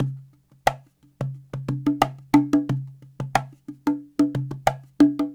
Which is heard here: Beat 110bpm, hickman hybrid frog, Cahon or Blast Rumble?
Cahon